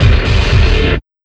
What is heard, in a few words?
Mole Bumpers, Fx; Sonic Foundry (Sony Creative Software); Esoterik Beatz